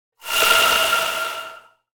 Sci-fi Transition

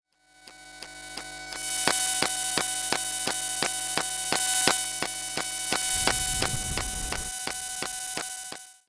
Long Buzzing Power Glitch Clicks

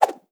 Swoosh Multiple

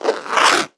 Zipper Zip

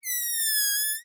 Balloon Whistle

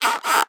Clean Double Creak

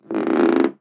Hollow Rubber Creak

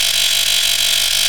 Loopable Mechanical Malfunction Rumble

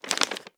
Dry Short Paper Crumple

